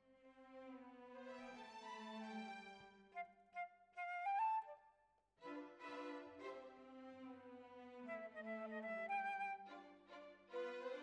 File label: Flute